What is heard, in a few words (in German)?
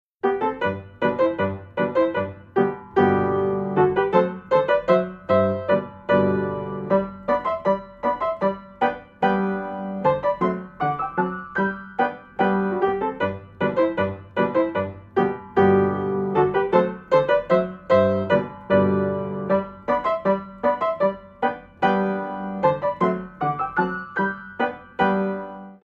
Walzer Polka